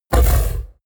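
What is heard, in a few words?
coloss snort deep short, creatures; kreaturen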